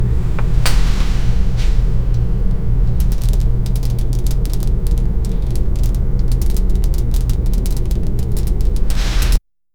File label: sliding rock